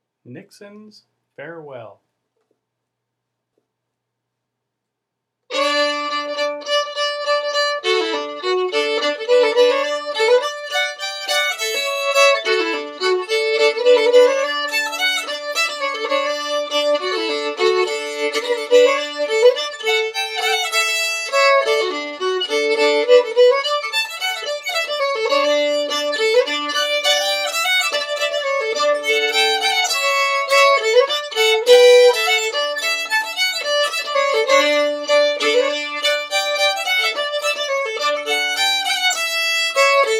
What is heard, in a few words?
fiddle; festivaltunes